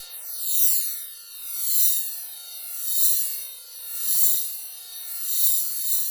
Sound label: METAL SW, Pads; Distored Reality 1&2